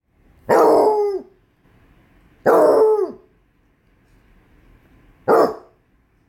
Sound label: Basset hound